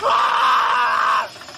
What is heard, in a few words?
screams; kermit